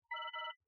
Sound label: alarm, computer